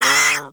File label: Catbrow Feral Vocal Pain; Enemies; Warframe